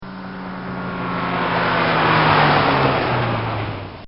Bmw Drive By; Car Sound Effects; Vehicles; Bobby Cole

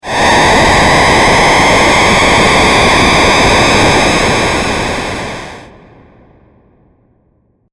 LoFi Distorted Transition Breathy; Scary Soundscapes

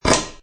Metal Drill; Metallic

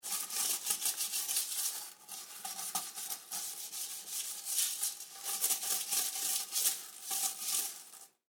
Household Toilet Brush Clean Scrub, bathroom; Bathroom Sound Effects; Bathroom (Sound Effects:Household); SFX Bible 6

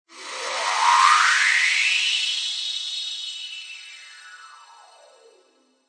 Magical Shimmer Sweeper; Magic sound of casting spell, conjuring or wizardry. Witchcraft ritual summoning elemental or otherworl

